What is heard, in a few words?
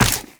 Run Ice, Footsteps; Warframe; Tenno